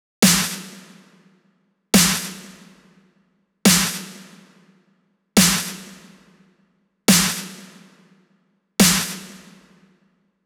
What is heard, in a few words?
140 BPM Break SN Wet; Essential Clubsounds Vol.5; Catch a Plane Kit; Root A#min; Drums & Perc